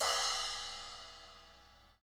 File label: Splash Low Vol